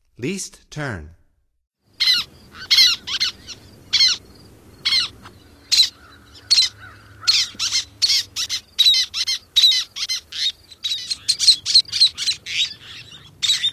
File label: Least Tern; North America; Stokes